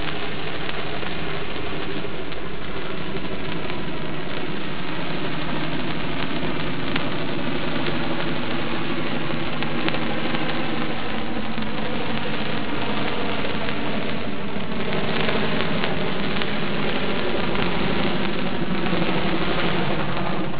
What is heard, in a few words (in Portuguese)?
Gravação Rebocador e lancha